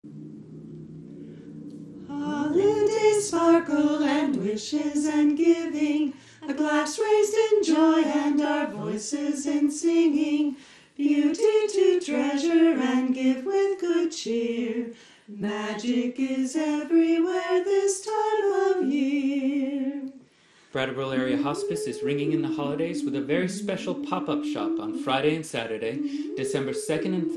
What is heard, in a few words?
Jingle